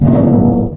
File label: pontoon scrape smooth, airboat; Half Life 2; vehicles